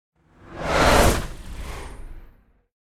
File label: Whoosh Sci-Fi Creature By; Hollywood Edge; sound designer's toolkit v2